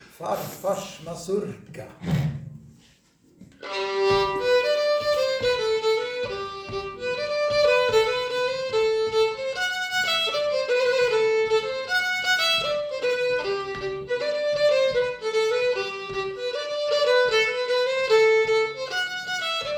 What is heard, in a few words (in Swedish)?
Farfarsmazurka